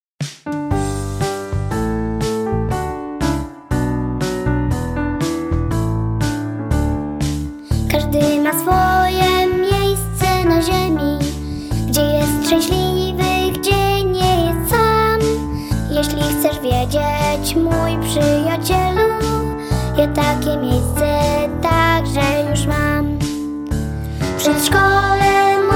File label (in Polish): Hymn przedszkolaków